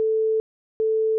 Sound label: tone gap